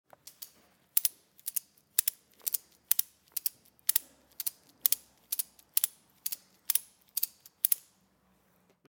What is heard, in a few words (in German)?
Riemenspanner